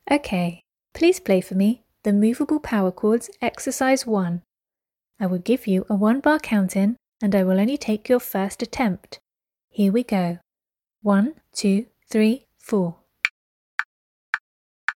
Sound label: moveable power chords